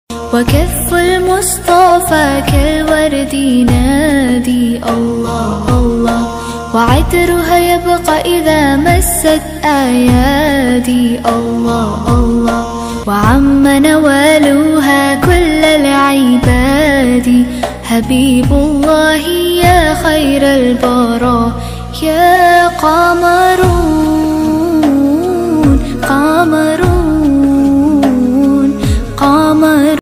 arabic ringtone

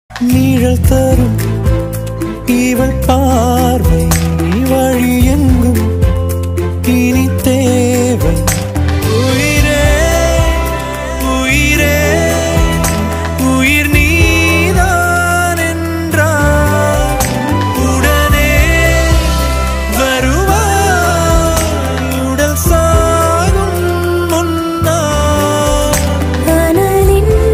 Tamil ringtone

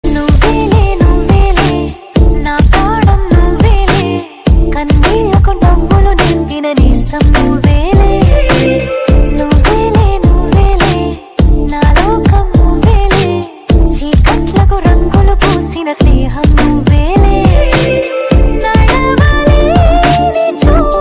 tamil ringtone

